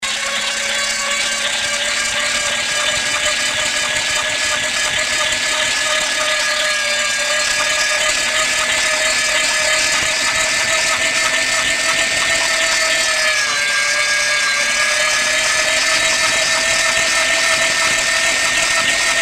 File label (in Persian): صدای چرخ گوشت